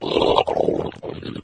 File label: death, flood